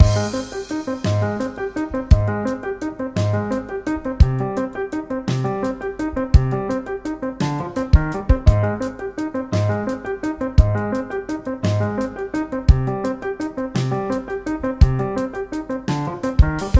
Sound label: Song 113 Bpm